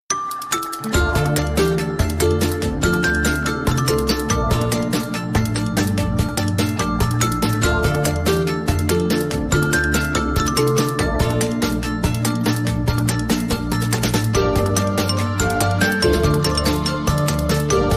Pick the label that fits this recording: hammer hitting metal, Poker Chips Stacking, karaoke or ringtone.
ringtone